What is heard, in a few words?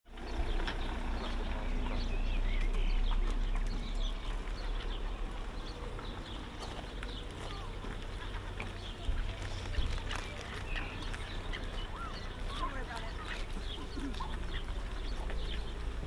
Near carpark, whitstable